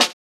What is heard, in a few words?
Snare, JUNGLE BREAKS; ORIGINAL JUNGLE BREAKS; JBK DRUM SOUNDS; JBK SNARES